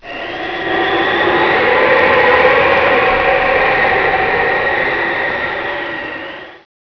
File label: Sound wind new